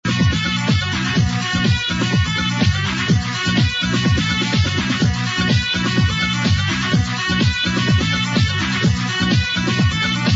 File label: sax o phone